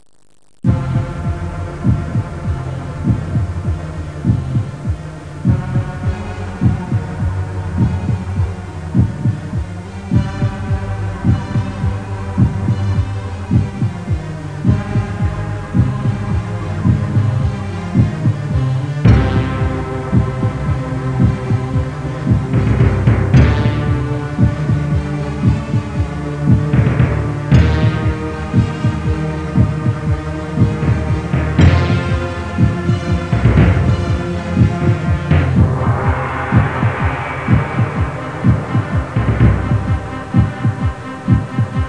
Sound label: panic; 20th Century Trivia Challenge